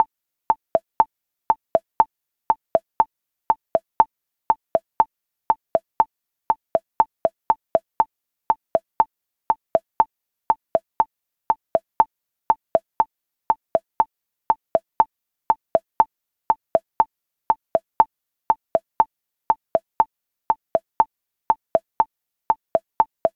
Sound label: Haunted House (Fast); New Super Mario Bros. 2; Leaf layer